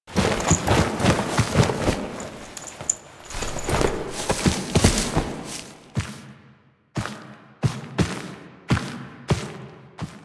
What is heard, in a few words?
Foley A; Drifter Lotus End